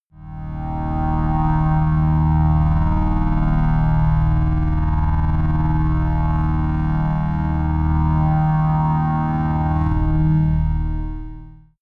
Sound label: drone slight stutterer; musikelemente